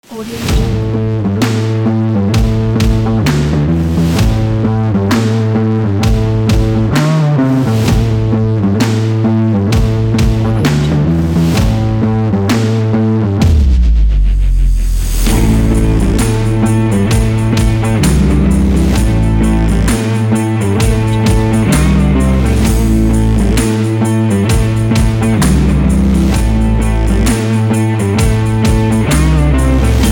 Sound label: rock beat